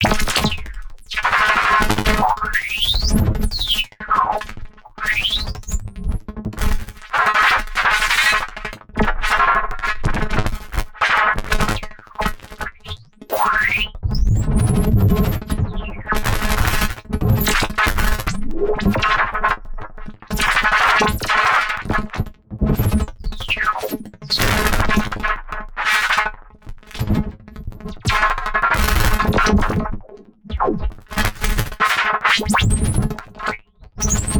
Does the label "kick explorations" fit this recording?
Yes